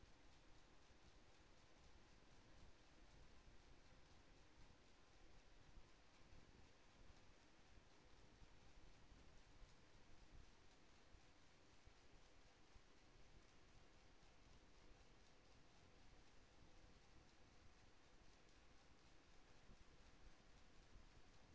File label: HYDROPHONE 16000Hz; JASCO AMAR HYDROPHONE 740